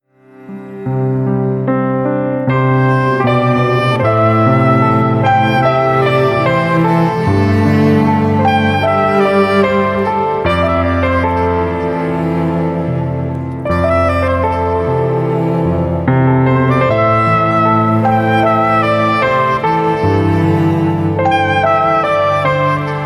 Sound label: CINEMATIC